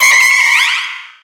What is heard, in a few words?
pokemon cry